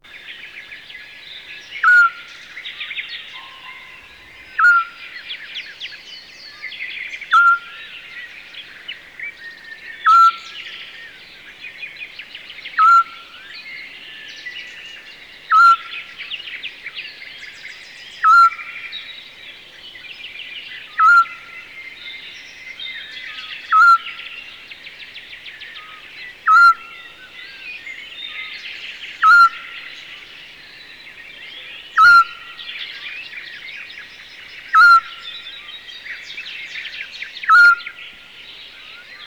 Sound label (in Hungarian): Füleskuvik, madárhangok